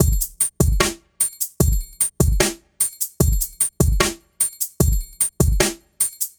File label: loop Reggae 75 bpm, drums; reggae style